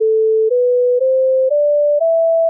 minor mid

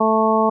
complex 220 lp

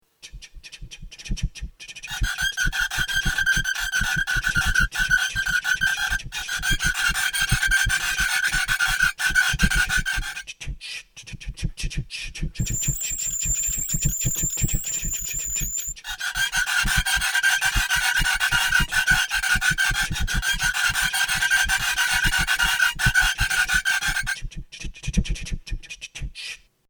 anxiety music

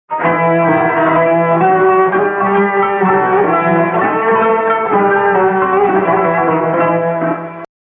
Turkish; Turkish 1 Foil 1

